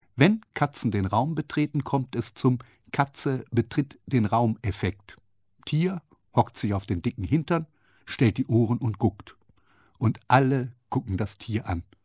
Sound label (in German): katze kommt; Abenteuer des Alltags